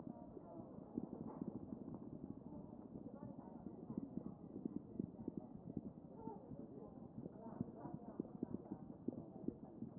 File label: CHEST pos5 D MODE